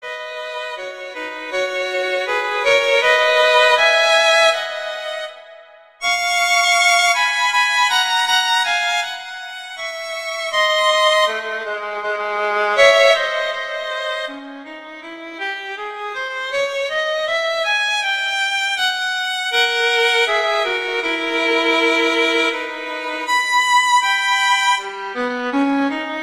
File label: Adagio; Reger; Suites; Suite No 3